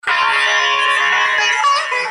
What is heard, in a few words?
happy shenai